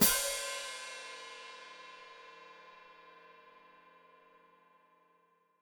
Hat Closed Open, Hi-Hat

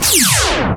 fx scifi; Ueberschall; Techno Trance Essentials

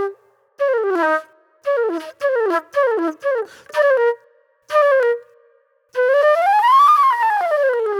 Super Soul Flute; Sonic Foundry (Sony Creative Software); Manhattan Flutes; The Funky Mama

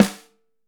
5X14, Drums; Big Fish Audio; Ross Garfield Drums VOL-2

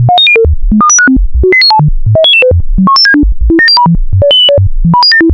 random sine; Zero-G Ambient 1

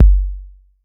BD 808

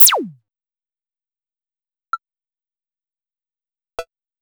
clav; Sonic-Core EDS16-V2

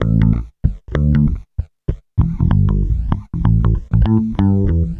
BASS, BASS GTR

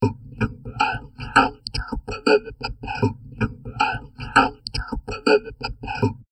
TALK, Drum Loops Crazy Processed

